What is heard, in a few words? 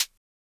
closed HH, Univox SR-95